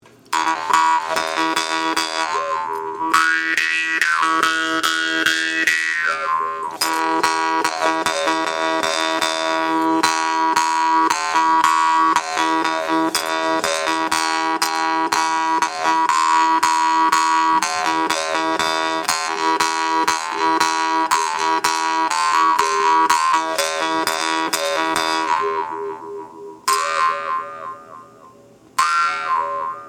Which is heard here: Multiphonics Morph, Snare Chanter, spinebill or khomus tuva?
khomus tuva